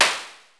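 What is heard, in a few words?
white noise grit snare drum; AMG - Vince Clarke Lucky Bastard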